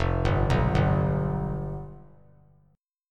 F Suspended 2nd Flat 5th, Fsus2b5 chord; arpeggiated; JGuitar

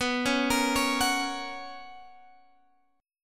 B Major 7th Suspended 2nd Guitar Open G, chord

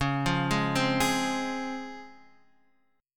Db Major 7th, Guitar, Drop D; x,4,3,1,1,4; DbM7 arpeggiated; JGuitar

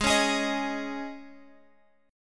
Ab Suspended 4th chord, Guitar, Open F tuning; Absus4; strummed; chordshape

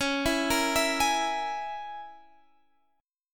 C sharp Minor 6th C sharp down

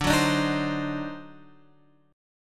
D 7th Flat 9th D down Guitar Open F; D7b9 strummed; JGuitar

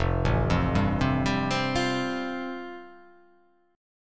F Major Add 9th; Fadd9 arpeggiated; JGuitar

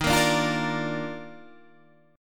D sharp Major 7th Suspended 2nd

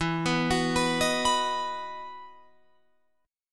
E Major 7th Suspended 2nd, Guitar, Open F; EM7sus2 arpeggiated

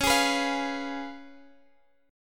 C sharp 7th; strummed; x,x,11,13,12,13

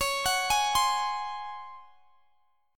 Db Major 7th Suspended 4th Db down; arpeggiated

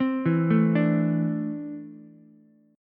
D 7th Suspended 2nd down Ukulele; D7sus2 arpeggiated

